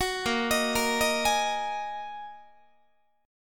A sharp 7th Suspended 4th Sharp 5th A sharp, Guitar; arpeggiated; chordshape; 21,18,18,20,19,19